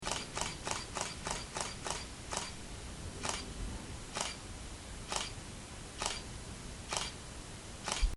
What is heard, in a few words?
Canon EOS Rebel T2i 50D Burst